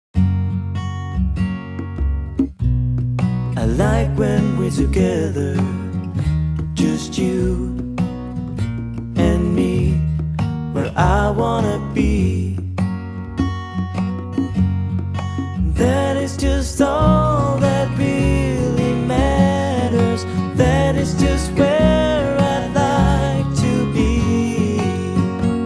Nokia Tones; Windows Phone apps

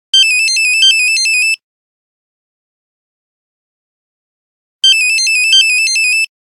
Nokia Buzzer; 3210; Chase; Windows Phone apps

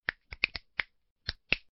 Snap Fingers, notifications; ZTE Axon 20 5G

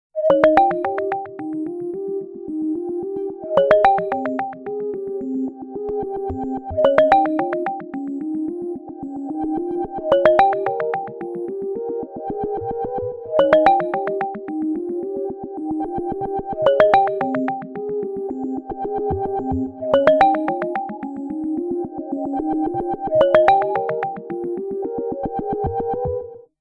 Nokia Tones; Windows Phone apps; Counterflow

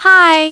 Shutter

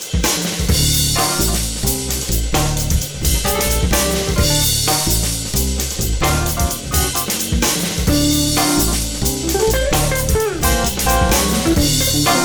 Jazz funky, alarms

